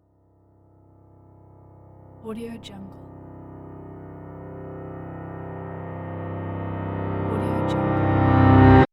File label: piano minor chord reversed; transitions and movement